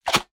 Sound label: player join